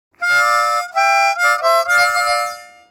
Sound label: Harmonica attack